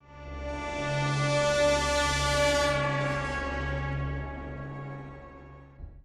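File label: sting, horn; transformers war for cybertron gamerip; IACON INVASION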